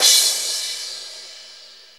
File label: CRASH, Dance Mega Drums; Best Service